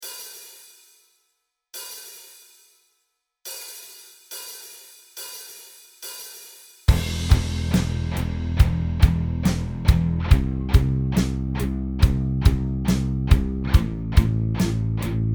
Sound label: Single Note Riff Song